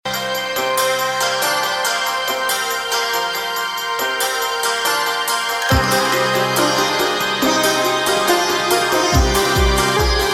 Indian Delight; SparkMusic; MIUI14

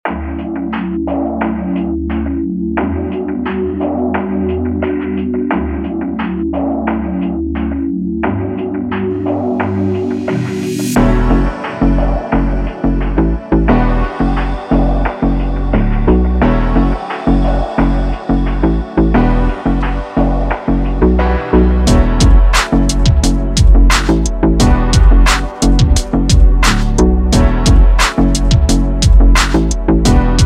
funk

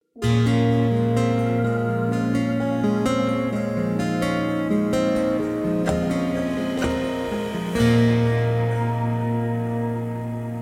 Sentimental Ambient Guitar; MIUI14; HyperOS

